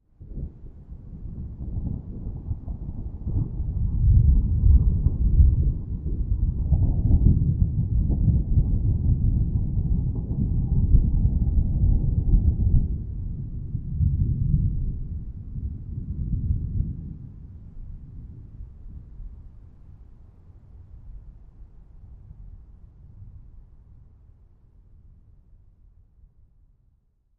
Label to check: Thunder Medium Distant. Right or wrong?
Right